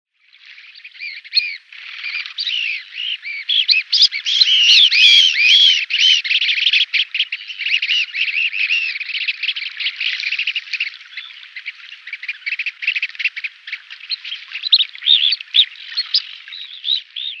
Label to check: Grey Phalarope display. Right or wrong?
Right